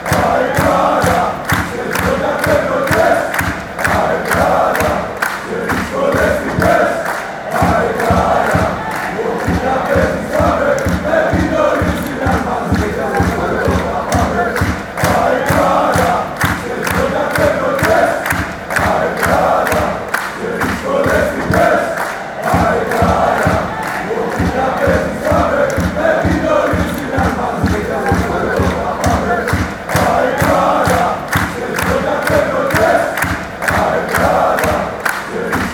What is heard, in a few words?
aek athens chant, fanchants